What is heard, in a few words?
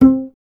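STR CBS, Solo Instruments, double bass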